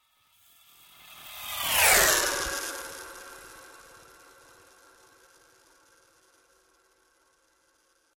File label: SCI FI VEHICLE PASSES; Various Sci Fi vehicle effects; Spacecrafts; Sound Effects:Science Fiction